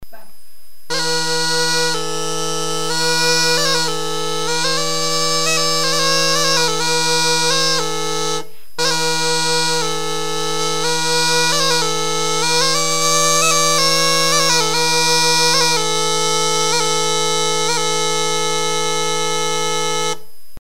Bena Doppia Cun Cornu, strumenti sardi; Rielaborazioni; URM Sonos de Sardigna; Il laboratorio di strumenti sardi di Raimondo Usai

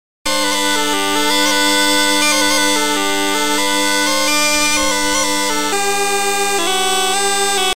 Launeddas

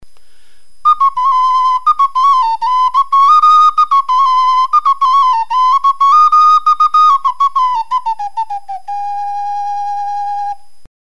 Pipaiolu Barbagia

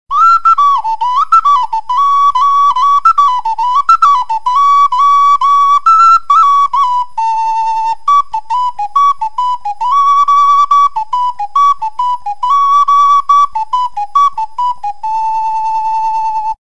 Pipaiolu Gavoi

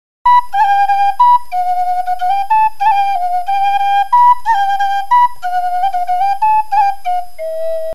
Flauto Traverso

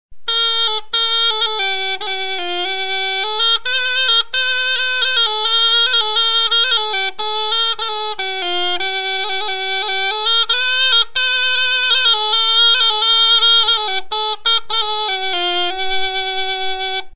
Urmofono Ottone

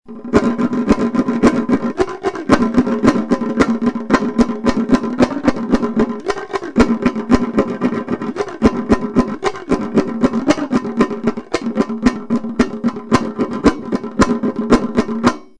Zimbomba

